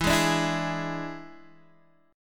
E 7th E down, E7 Chord; strummed

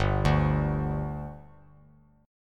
G 5th G, chord; G5 arpeggiated

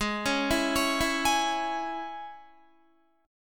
Ab-Suspended 4th Sharp 5th, Guitar; Absus4#5 arpeggiated; 15,16,16,18,16,16; JGuitar

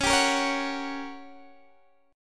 G Minor Major 7th Flat 5th, Db

